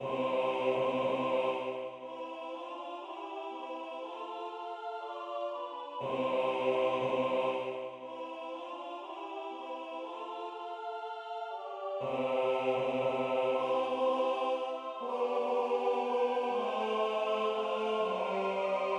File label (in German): Gloria mit Soli Bass